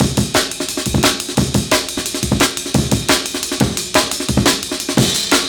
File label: Amen Clean 175, classic dnb breaks